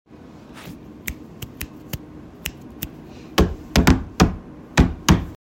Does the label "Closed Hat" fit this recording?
No